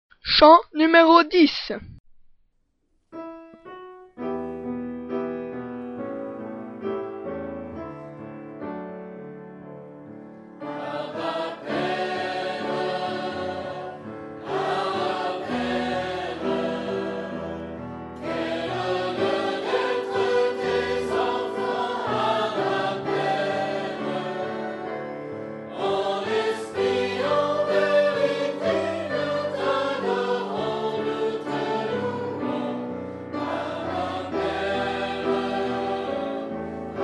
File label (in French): Chant; FR